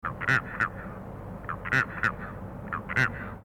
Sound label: eupodotis caerulescens, birds calls; otitidae